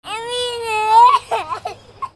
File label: PEOPLE